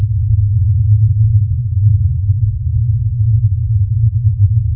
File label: wind bass; cstrike